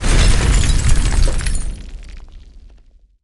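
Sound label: c4 explosion debris; weapons; Counter Strike Model Pack; CS2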